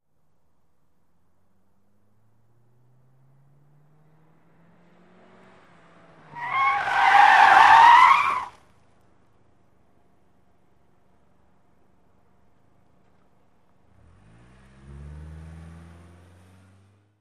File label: Sedan 180 Rev Skid Mx